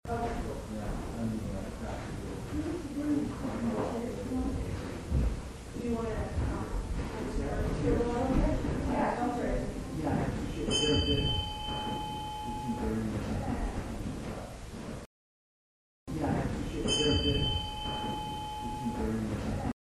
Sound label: birdcage theater bell